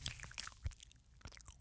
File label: lava; Mob